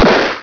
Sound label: shot; 无敌战机